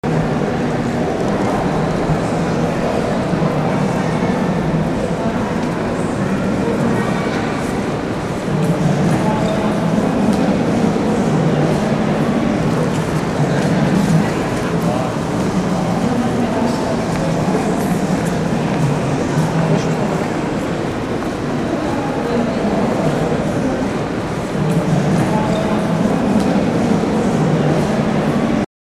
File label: station steady atmo